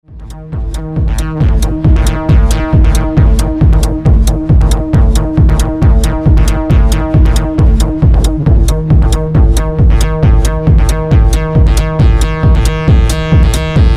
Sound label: ritual drums